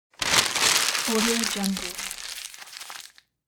crumple up small paper bag

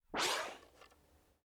pneumatic squish open close forward reverse

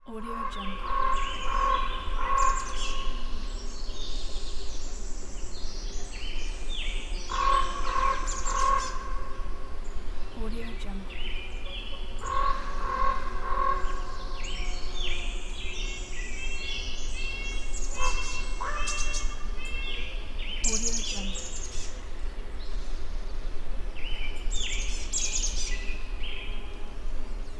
a forest

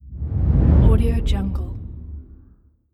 whoosh

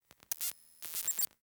beeps

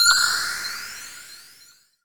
ambient game score sonar coin; esmteam

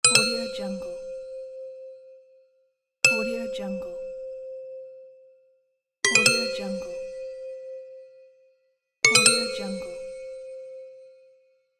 idea indication

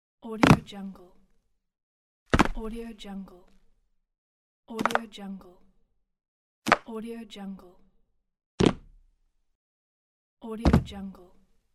jump

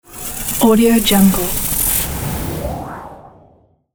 scifi charging

